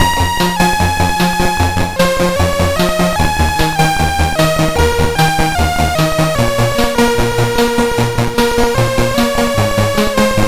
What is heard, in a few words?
Synth Sample III, CSG8580R5